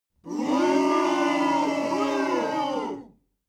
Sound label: male crowd booing short